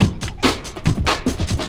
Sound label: beatmashed